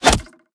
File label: roman ranged bow player hit; smite; npc; roman archer